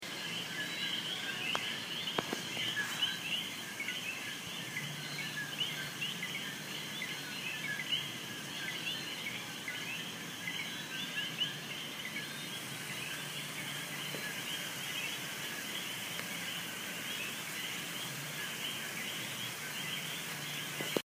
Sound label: Tree frogs in Puerto Rico